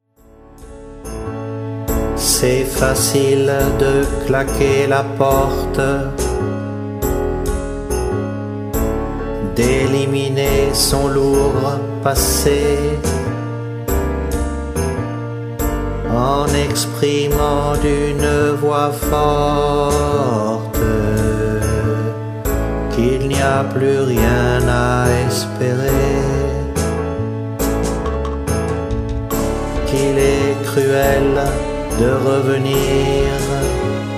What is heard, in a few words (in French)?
Chant